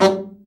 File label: BRA Bari, SAX; Best Service Gigapack 1; BR SAX 2